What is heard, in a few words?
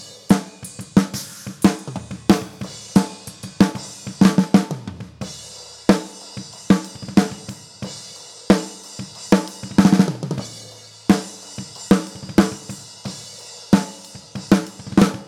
snare